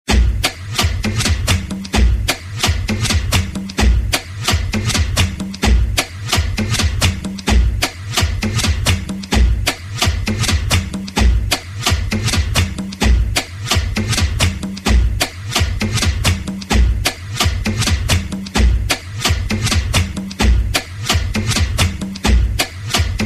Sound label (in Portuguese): Base tambor